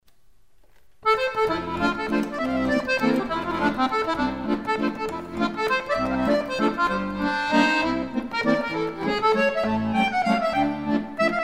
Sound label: swing valse, akkordeonist